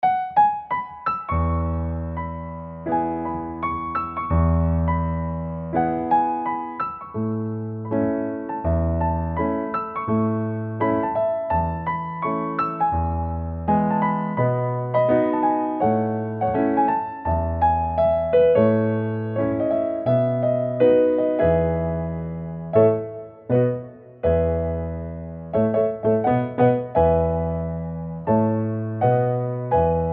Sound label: piano